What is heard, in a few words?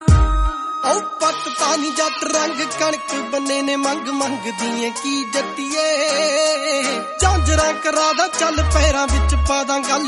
New Punjabi